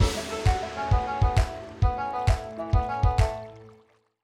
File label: jackpot Win